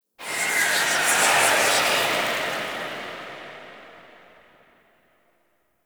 Wind; Warframe; The War Within

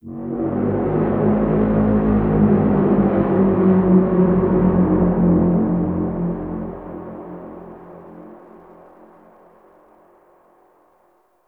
Terraforming Egg Vocal Wet; Warframe; Tau

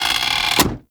Rolling Drone Turret Turn; Warframe; Grineer

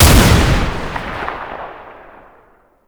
Bayonet Shot; Warframe; Tenno

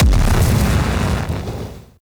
Explosion Large; Warframe; Weapons; Thanotech

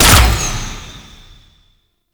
Short Bow Fire; Warframe; Duviri; Dax; Weapons

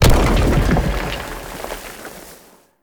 Octopede Unearth Proj By Explosion; Warframe; ManInTheWall

